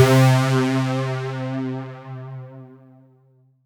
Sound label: C4, synth; 90 s Sample CDs; NiceBeats; SynthLine VOL-23